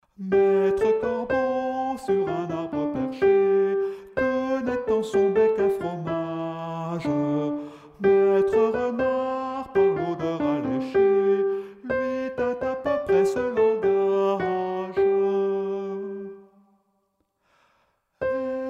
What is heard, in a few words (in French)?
soprane; LE CORBEAU ET LE RENARD (soprano chanté)